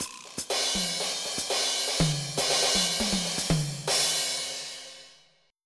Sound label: FUNK